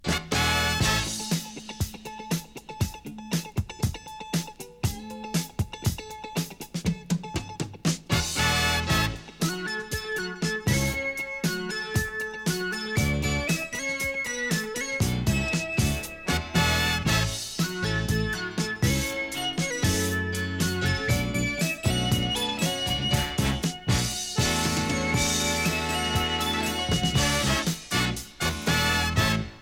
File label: topformat maxi jingle single